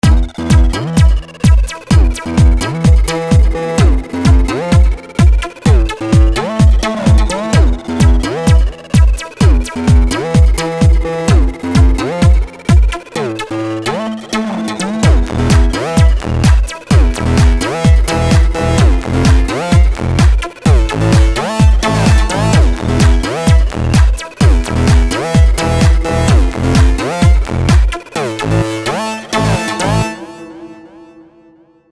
tech prog house

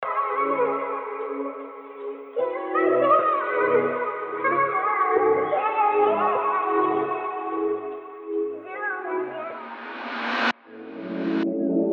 chopped rnb

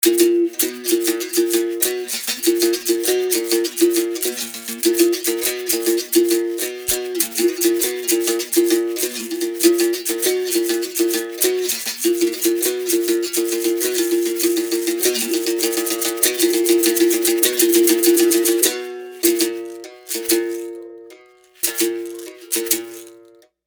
berimbau

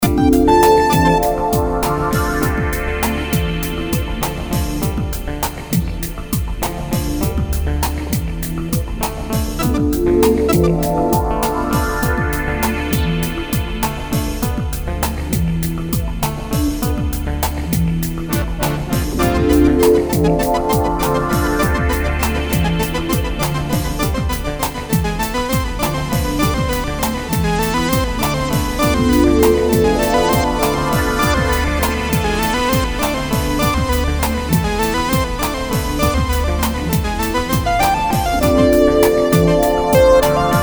lounge tango

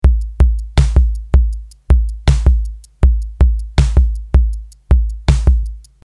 drums computer clean

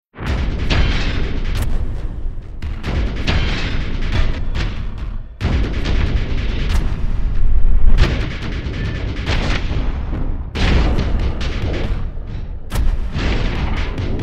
slam action

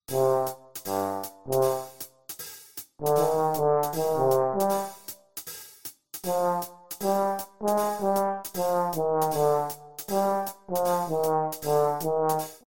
trombone staccato

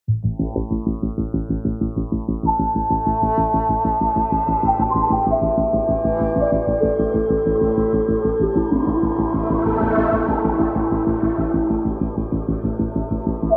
electronica

